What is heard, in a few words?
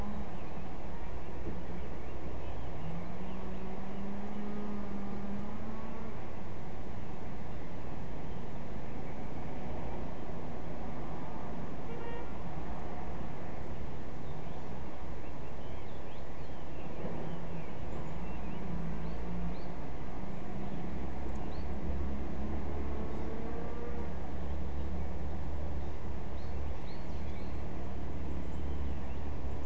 mixture of biophony anthrophony